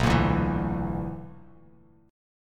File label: B-Minor Major 7th Double Flat 5th, Bass Standard; strummed; JGuitar